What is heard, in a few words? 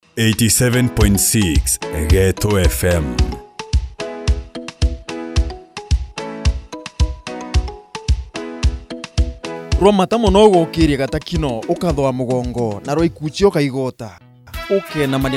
RHUMBA SPECIAL